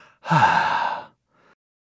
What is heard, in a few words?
Men Emotes Sigh Relief